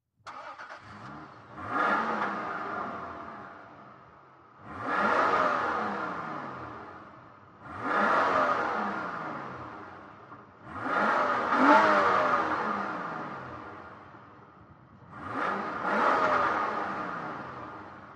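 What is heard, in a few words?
SUV Start Engine Rev Tire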